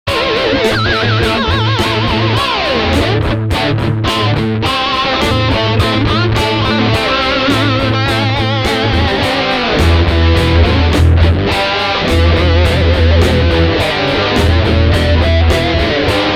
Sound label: solo; Technology Exchange